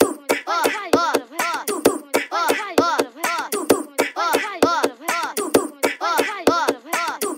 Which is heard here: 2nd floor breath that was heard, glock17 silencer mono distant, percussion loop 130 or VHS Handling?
percussion loop 130